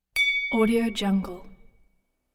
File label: glass clink